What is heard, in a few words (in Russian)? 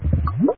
puziri v laboratorii